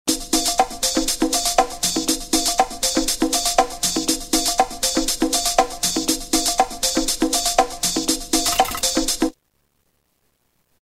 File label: Disco Cga 120; Roland M-DC1